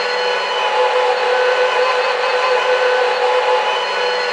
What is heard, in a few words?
blower spin